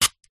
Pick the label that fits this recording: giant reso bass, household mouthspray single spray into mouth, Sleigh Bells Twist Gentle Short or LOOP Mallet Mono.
household mouthspray single spray into mouth